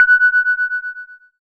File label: SYN WET FEE, Microwave; Sound & Vision - Gigapack I CD 2